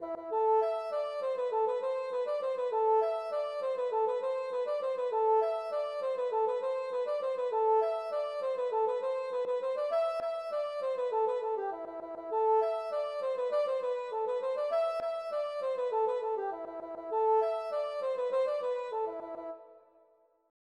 rond St Vincent

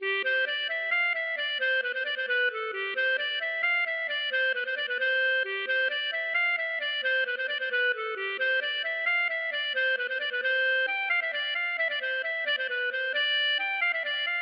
bourree; Tournee A Trois

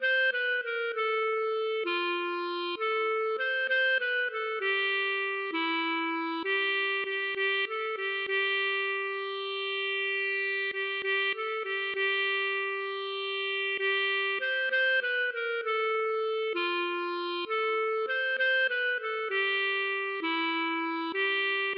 valse

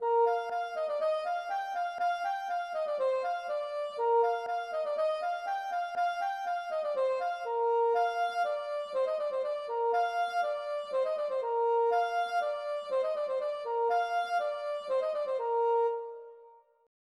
polka Piquee

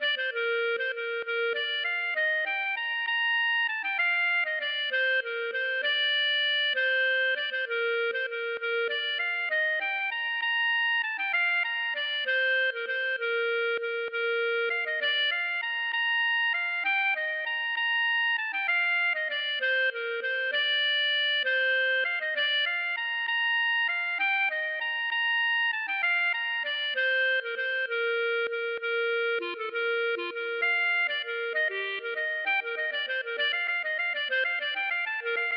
valse

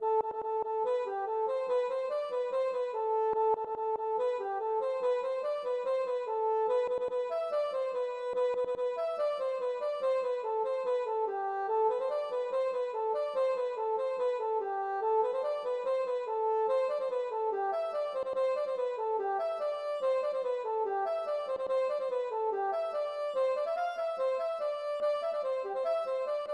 pile menu

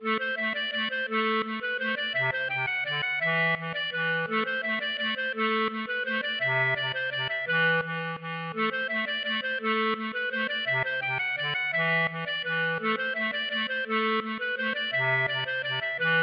valse

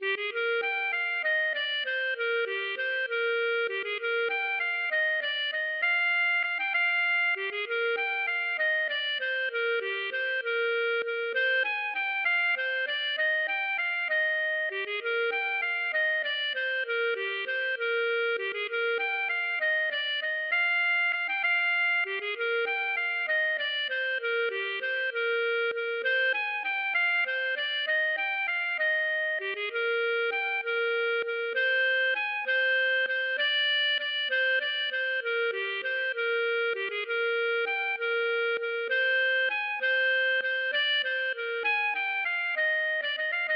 valse

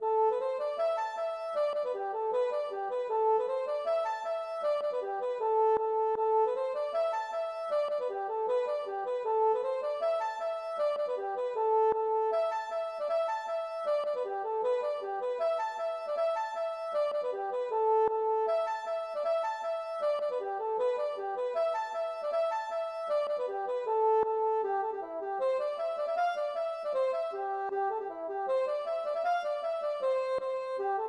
polka Irlandaise

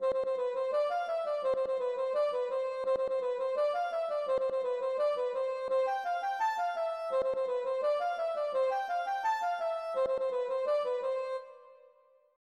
ridee

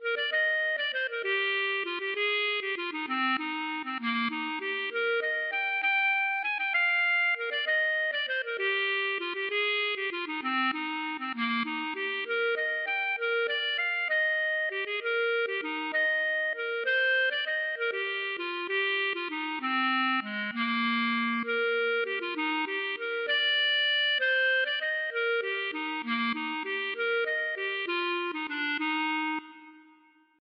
valse

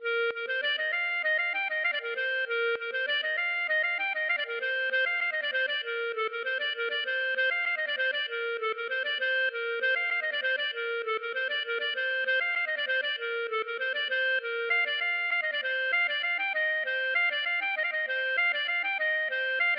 laride gavotte

